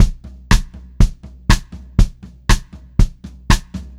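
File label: 120 pop variation, Pop-Rock Drumloops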